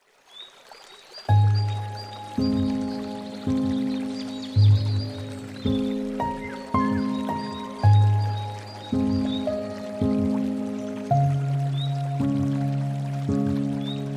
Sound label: Lullaby; مخصوص یوگا و آرامش